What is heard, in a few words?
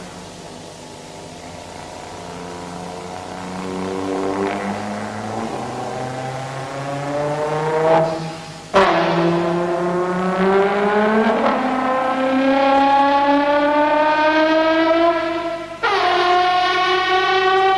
motorbike concerto